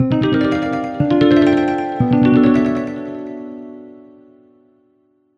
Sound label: stylish, jingle